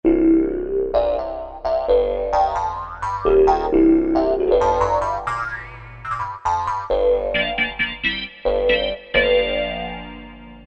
Korg T3 Res-Synth

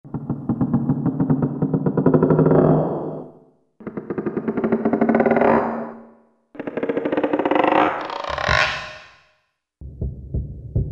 Door Open

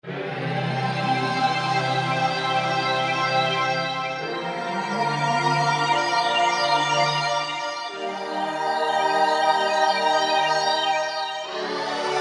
AlphaWaves, Korg T3

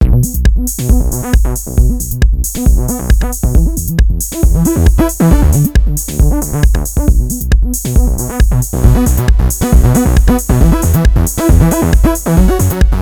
Bass Maschine, Korg Radias-R

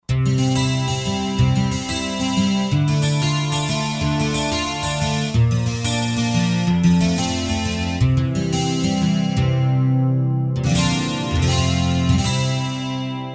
Steel Away, Roland JV-2080

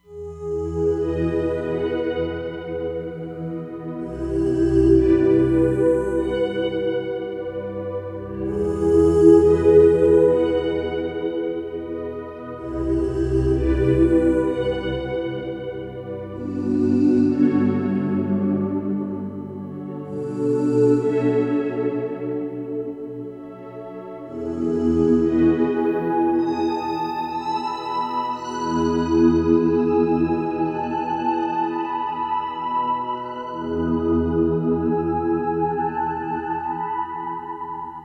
Roland Fantom XR; BeautifulOne

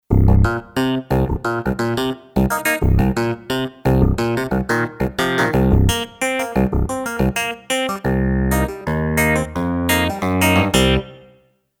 Brite Klav, Kurzweil K2500X; Factory Programs

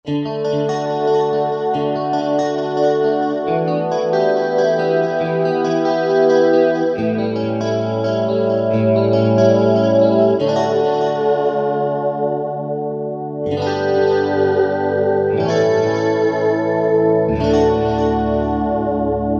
Roland JV-2080 JC Strat

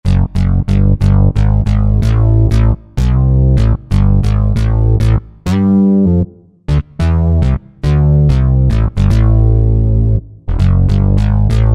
Mondo Splat Bass, Korg TR-Rack

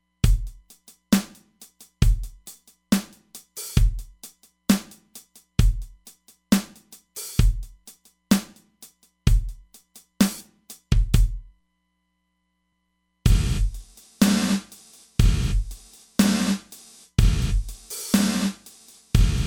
Long Gate, Roland DEP-5; Factory Patches

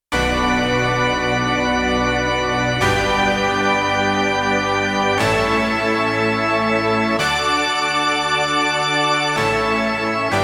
Stacked Organ; Rock Performance 2

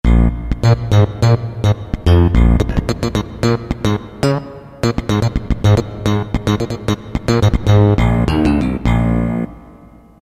Slap Bass, Roland MT-32

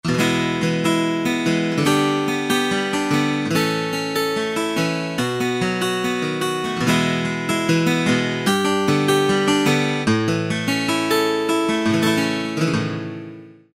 A. Guitar, Roland U-110; Factory Patch

